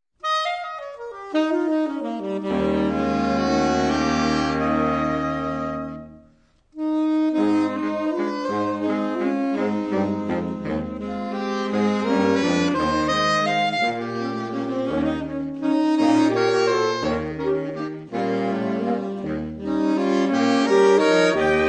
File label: Saxophone Waltz